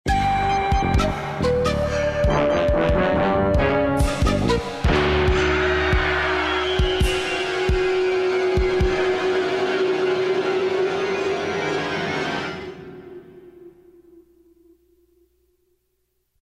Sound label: dramatic interlude; monkey island 1 the secret of monkey island